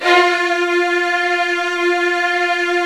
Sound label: Virtuoso Strings